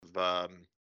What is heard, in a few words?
uhh